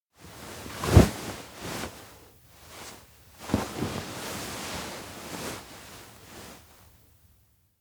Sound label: futon lie two; anemoi